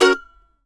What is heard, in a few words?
MANDO F# MAJ R; Sampleheads; Whole Lotta Country 1 & 2